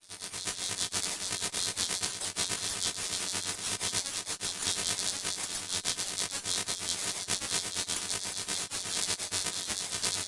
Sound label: designed ui calculation; emf; Sonniss GDC